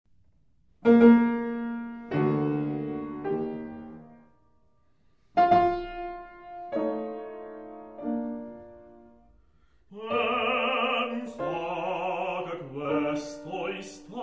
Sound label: For Alto And Piano; Glinka; P.Metastasio